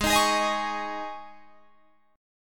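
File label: Ab Suspended 4th chord, Guitar, Drop D tuning; down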